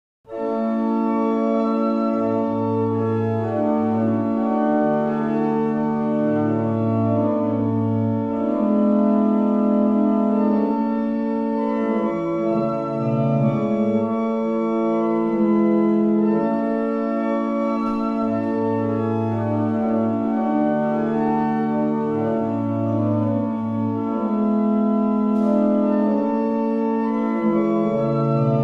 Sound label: Helmsley, barrel organs; Avington